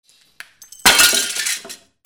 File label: BREAKING